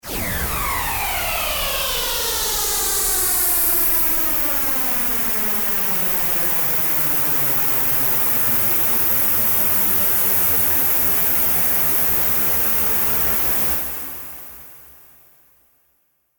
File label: Pink Noise Delayed; Techno